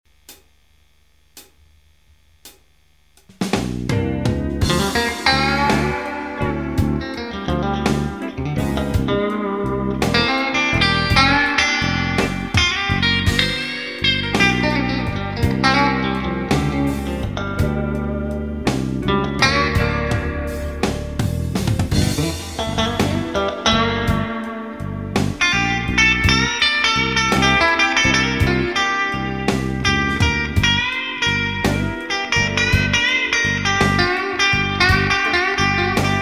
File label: clean, strat vintage